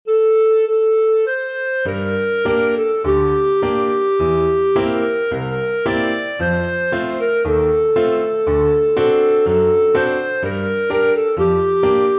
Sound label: kinokio, clarinet